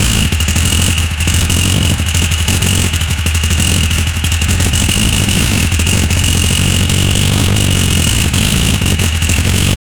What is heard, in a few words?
soft motorcycle engine